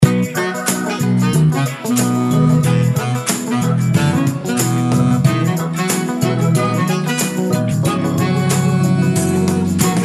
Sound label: tutti; Bamse